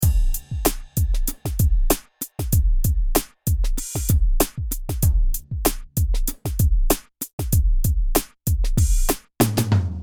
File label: beat